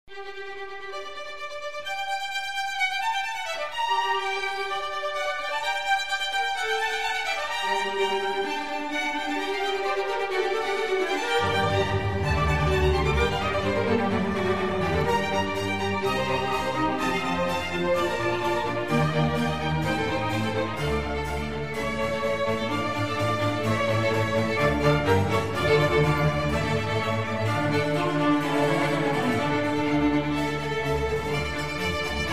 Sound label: Concerto for Strings in G minor; RV155; Antonio Vivaldi